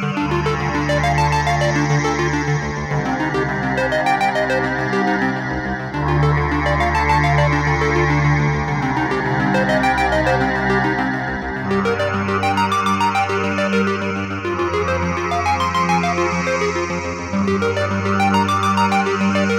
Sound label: Castle 8 Bit Stein, looping music